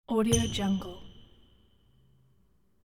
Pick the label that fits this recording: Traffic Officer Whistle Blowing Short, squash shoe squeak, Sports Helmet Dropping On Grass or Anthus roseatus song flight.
squash shoe squeak